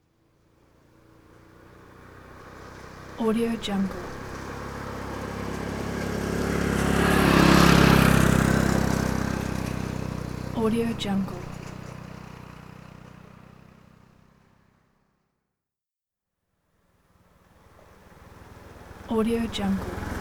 passing motorbikes; lokohighman

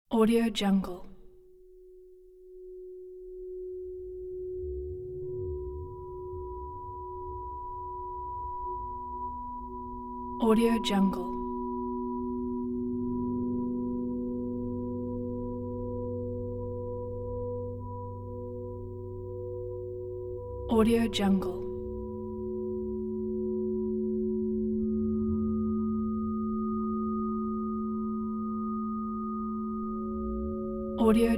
space desert ambience

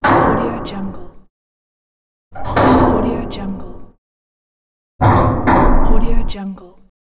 heavy metal hits

